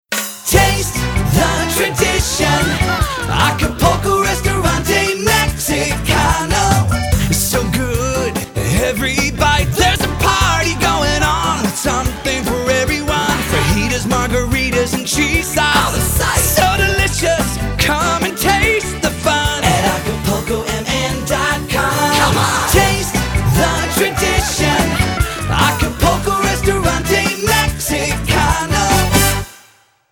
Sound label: Restaurante JINGLE; Acapulco; restaurant jingles